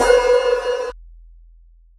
Hats, Alesis Performance-Pad

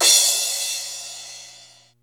RY30 CRASH; Hollywood Edge; The Big Bad Beatmachine

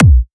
Trance Kicks

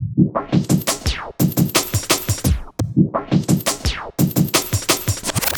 ARDCORE, Breaks; neuro; Sinistarr

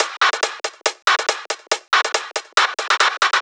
Loops 140 BPM Hihat Percussion Loops

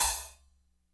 HAT, KLANKY KIT; Spectrasonics; Burning Grooves CD2

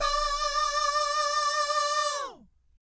VIB FX, Jazz FX

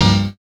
chords

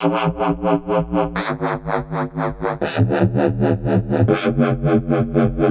Dub Step Bass; KJ Sawka; Drum n Bass & Beyond

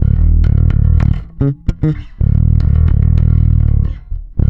NASTY D#, bass; Spectrasonics; Bass Legends

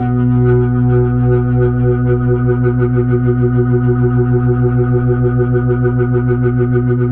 HAMMOND; 3D Sound; 3D Keys VOL-1

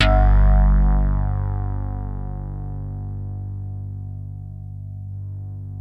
DIGI RHODES; Sound Gallery - Charged

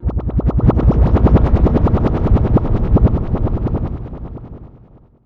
Guitar Textures

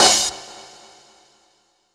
CYM, DRUMSAMPLS, Disco Fever; Best Service ProSamples vol.48 - Disco Fever; AKAI

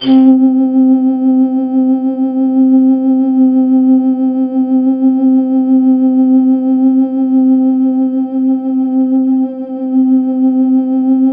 C3, Synth Line; 90 s Sample CDs; NiceBeats; SynthLine VOL-32

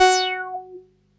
505, String Machines

